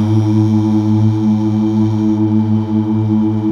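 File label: Male Uuh; Best Service Gigapack 1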